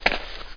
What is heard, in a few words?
step dirt; SpecOps2 MPlayer Demo